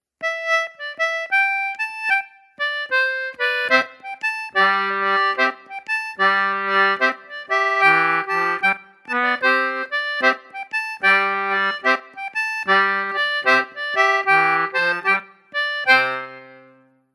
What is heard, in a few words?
bobbing joe intro, morris; morris contemporary